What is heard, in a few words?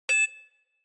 Cyber